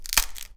CUT, household; AKAI Anime Sound FX

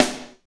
SNR, R&B Kits, Roland; Northstar - Drumscapes Roland; Wet Kit

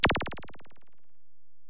EPerc, Alesis DM-PRO

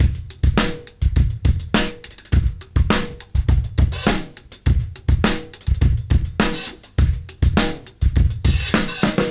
fx, Beat Loops, 103; Zero-G; Monster Beats

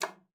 KLPB G#4, ALTOSAX; Xsample VOL-2

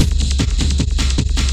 SPEED, Big Beat Drumloops; USB Soundscan vol.25; AKAI

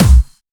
Distortion Kicks, Bassdrums

